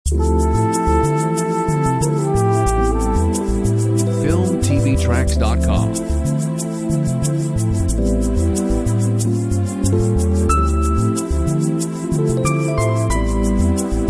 Groove By Night

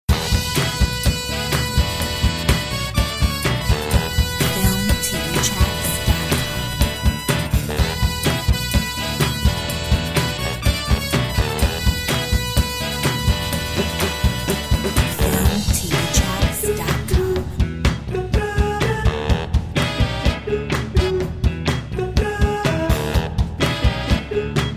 vocal Mix

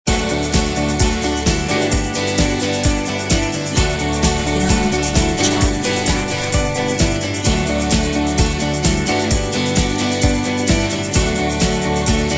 Carefree and happy

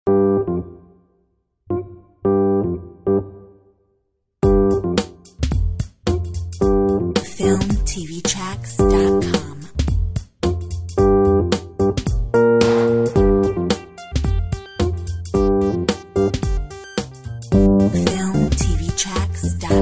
Soul Jazz

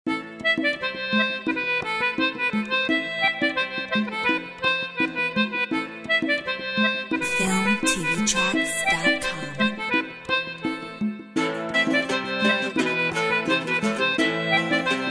The Irish Pub

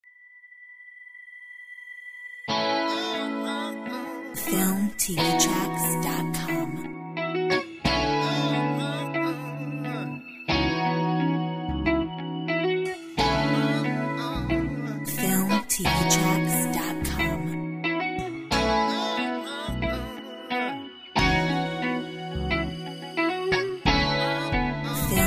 Cool funk; Filmtv-tracks

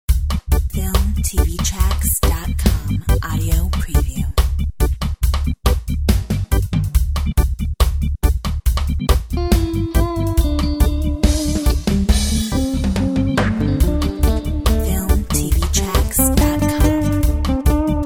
Reggae

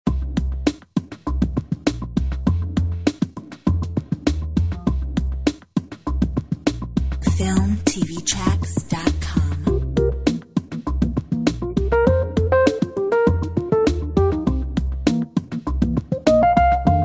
Jazz

